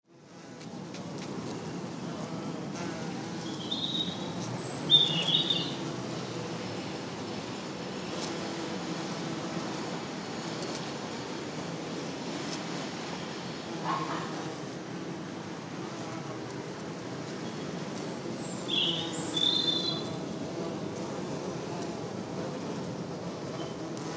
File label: Bees In A London Garden